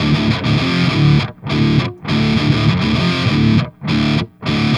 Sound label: Guitar D to F 5ths, Rock